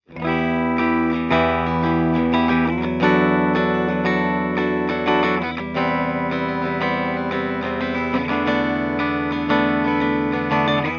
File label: bridge clean, pickups